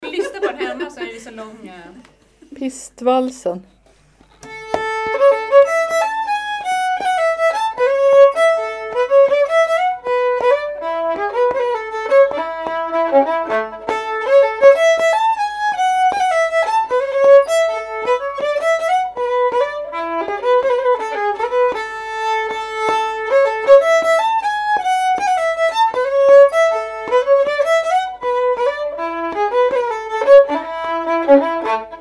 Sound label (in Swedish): Pistvalsen